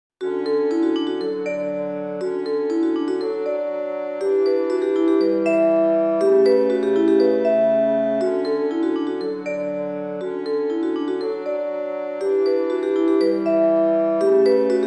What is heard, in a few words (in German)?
happy go blaesertrio mallet